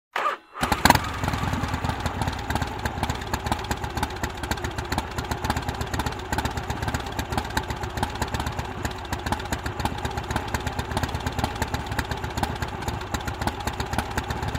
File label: Harley-Davidson motorcycle starts, waits, turns off